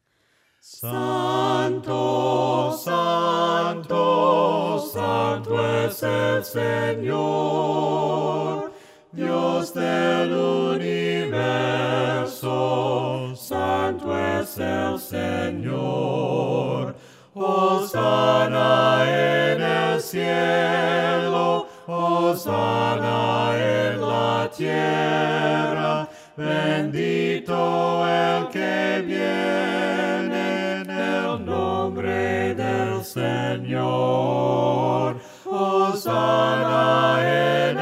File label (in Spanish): VOCES; HOSANNA EN EL CIELO